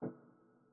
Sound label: GUI Sharp positive; the 39 steps